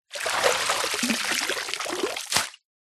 plastic bag bin liner empty lift out deep water drips set down; foley